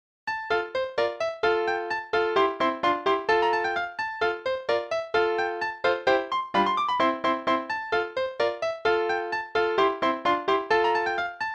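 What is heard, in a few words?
Play-along, Allegro in F Major, quarter note=130; Haydn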